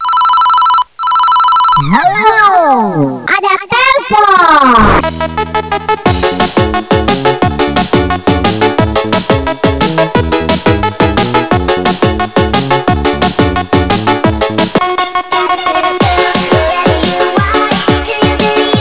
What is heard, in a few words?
Groove Anthem